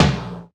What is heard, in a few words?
Old Skool House Perc